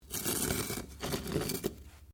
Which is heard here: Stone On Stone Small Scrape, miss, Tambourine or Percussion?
Stone On Stone Small Scrape